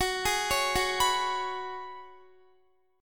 Gb Suspended 2nd Suspended 4th, Guitar; arpeggiated

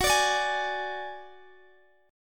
F sharp Suspended 2nd Sharp 5th, Guitar, DADGAD

